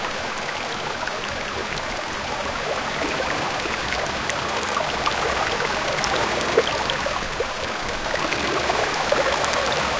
soft flowing stream with, river

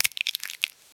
resource completely harve, harvest

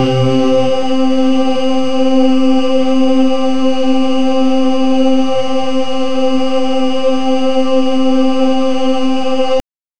a grimy cerebral synth pad

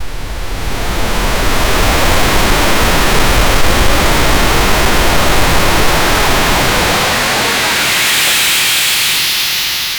shock waves wirh whoosh

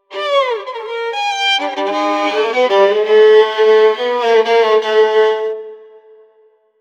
dark cartoon musical

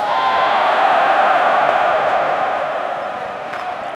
hoard chear at stadium; cheer

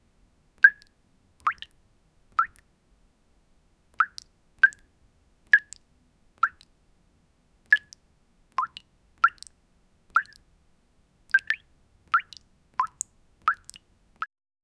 magic water button

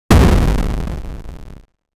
16 bit cannon blast deep; digital

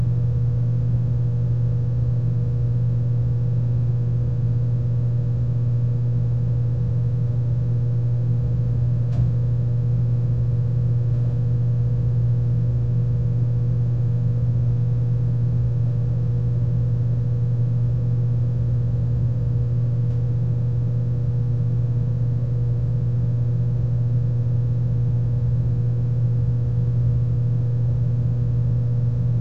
household sound loud hoov, cleaning

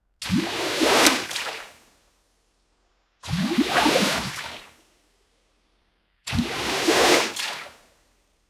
strong splash and push, pool; strong splash and push so

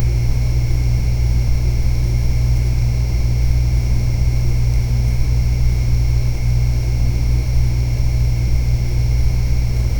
soft 1950s soda fountain, indoor

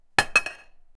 take ceramic object from; ceramics

